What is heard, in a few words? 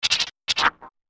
alien chicken